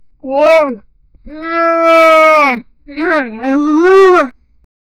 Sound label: funny uuuuuuuuuuu, character